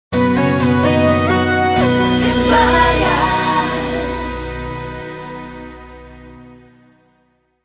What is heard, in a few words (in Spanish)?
audiologo; emvarias